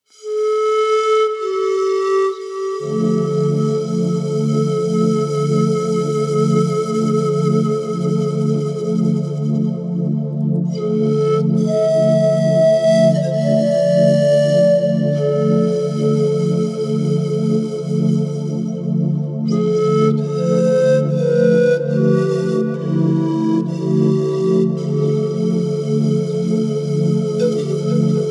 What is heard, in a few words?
Pan Flute; Concept Samples